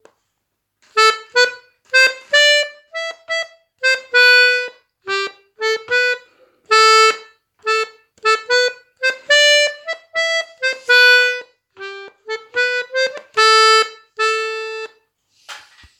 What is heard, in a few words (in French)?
Ton Double melodie A; Fisel